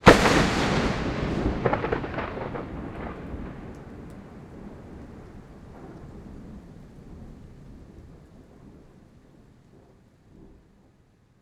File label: Close Thunder Crack; Mid-Side